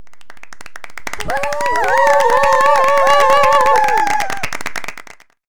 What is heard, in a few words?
Cheer